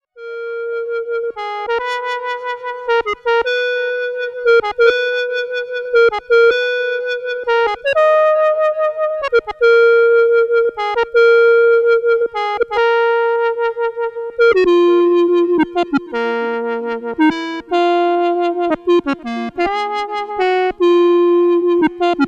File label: altern wave